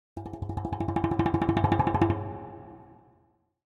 Drums Percussion Danger - Slower Drumming On A Thin Metal Percussion; Hollywood Edge; sound designer's toolkit v2